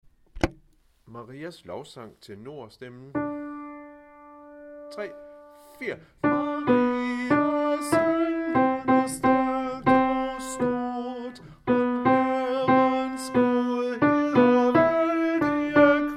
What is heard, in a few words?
Maris lovsang tenor; harlev koret